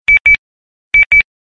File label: sms bip bip